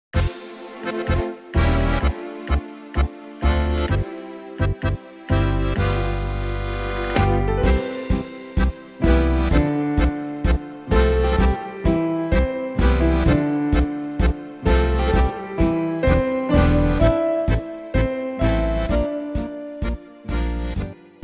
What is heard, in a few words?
TANGO